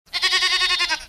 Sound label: sheep